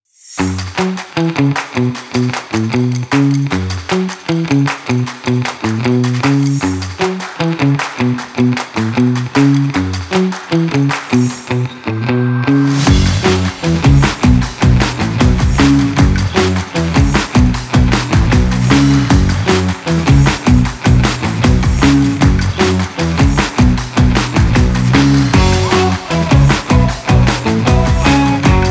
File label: Indie Funk Groovy